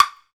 Claves; Spectrasonics; Supreme Beats; African Contemporary

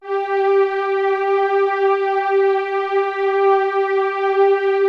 PWM STRNG G3, SYNTHSTRINGS; Music Store - Claudius Diemeter Schwerlos

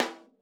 RAWSD5V1, Drums Acoustic; USB Soundscan vol.10; AKAI; 90s sample CD; ALL SD

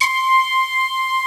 C5 L, LEAD; Music Store - Claudius Diemeter Schwerlos

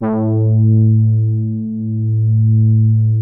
POLY MID A2, SOFT BRASS; Music Store - Claudius Diemeter Schwerlos

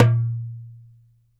DARABKA, Mediteranean Traditions percs

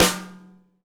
WG SNARE; KITS-SMALL

